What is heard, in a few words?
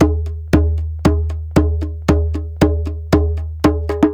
PERC2, Roots Disco; USB Soundscan vol.32; AKAI